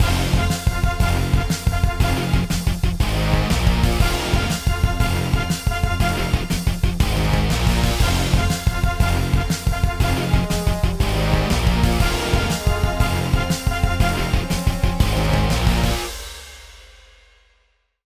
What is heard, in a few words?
rock groove; Jamie Coleman, Film Composer